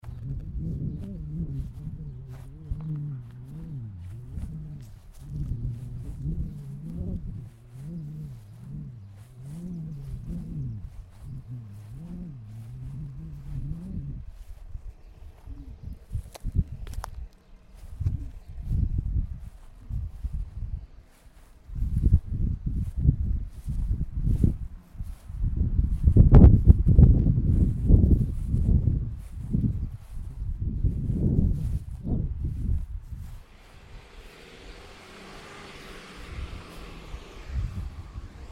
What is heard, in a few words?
FOOTSTEPS TRAFFIC; HUDSON